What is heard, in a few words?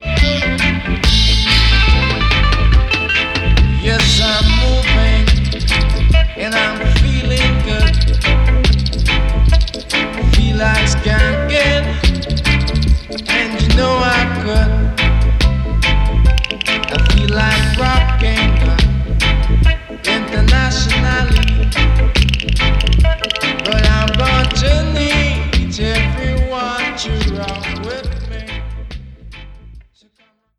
Rock